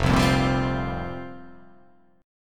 F Suspended 2nd Suspended 4th F down

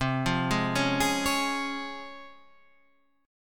Db Major C, Db/C chord; arpeggiated; JGuitar

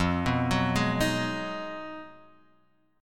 B 6th F, guitar chord; B6/F; arpeggiated

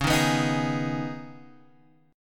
Db 7th Suspended 2nd Db, down, Guitar Standard; strummed; chord shape; JGuitar

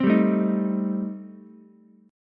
A Minor 7th Flat 5th A Ukulele, chord; Am7b5 strummed; 5,7,5,6

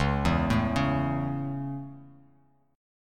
Db Minor Sharp 5th Db down; arpeggiated

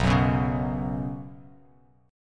B Suspended 2nd, Bsus2 chord, Bass Standard; Bsus2 strummed; 7,9,9,6; JGuitar

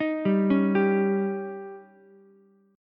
Db Suspended 2nd Flat 5th chord, Ukulele; arpeggiated; JGuitar

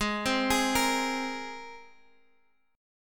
G sharp Major Add 9th, Guitar, Half Step Down

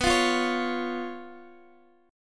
B Suspended 2nd Flat 5th down Guitar DADGAD

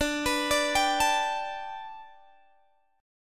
G Major Add 9th Guitar Standard, chord; Gadd9/D arpeggiated; JGuitar

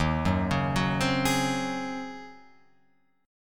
Eb Minor 13th Eb down Guitar Open F; Ebm13 arpeggiated; JGuitar

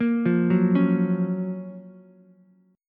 Gb Major 7th Gb Ukulele; GbM7 arpeggiated; JGuitar

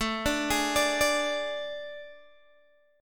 A Major 7th Suspended 4th, Guitar, DADGAD; chord

